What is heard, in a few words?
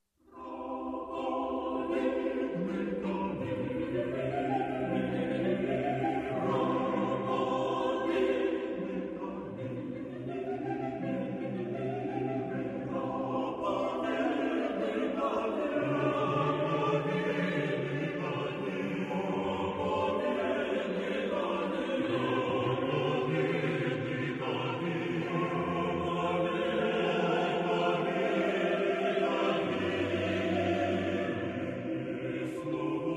Ukrainian baroque 18 century